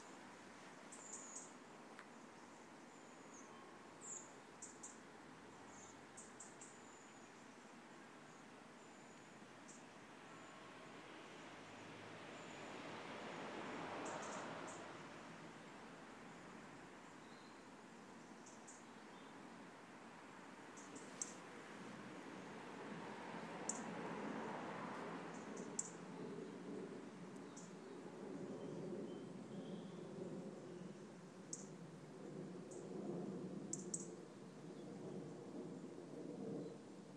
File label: birdsong; snow